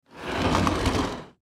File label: Camera Case Slide Cement, Pelican Professional Hard Shell; Plastic; Cameras; Technology; Blastwave